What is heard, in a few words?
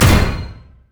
vortex; Diana